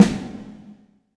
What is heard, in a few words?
Snare, Alesis DM5 Drum Machines